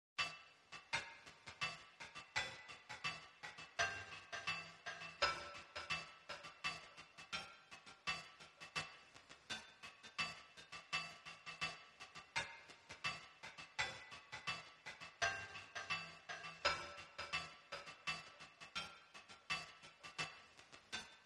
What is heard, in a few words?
Drums Only